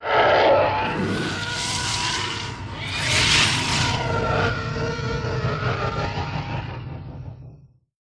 Flyby

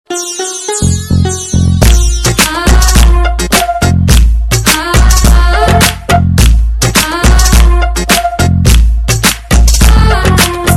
Dj Music

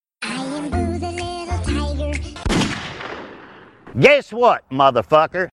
Tiger Shot

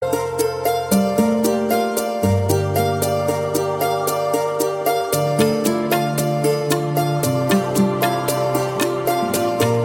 Casio

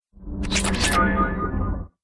Mining; Mass Effect 2

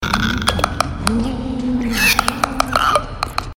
Clickers

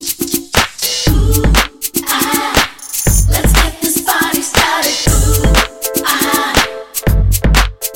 Ooh Aah

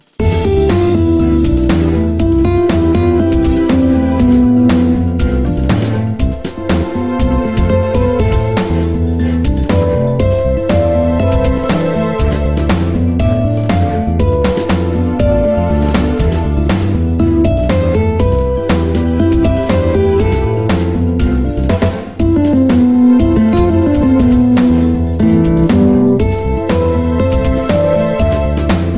Suspenseful Romantic

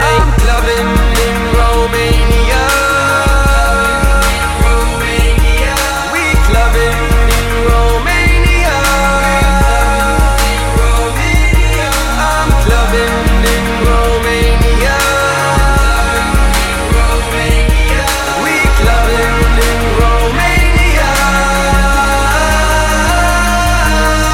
Clubbin

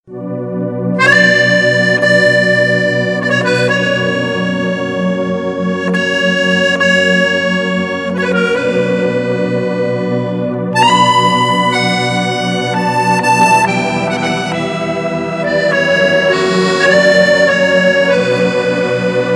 Accordion

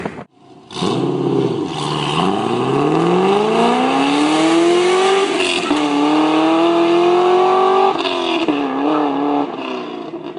2005 gto exhaust

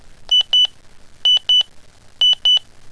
Minitor 5 Tone

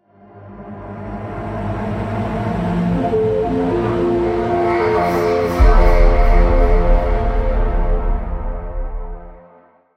Chime Stinger; Bard

